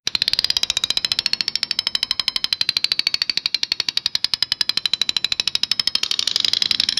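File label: wheel of fortune being spun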